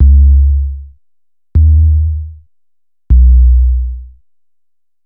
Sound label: Bass, Futurist Drum & Bass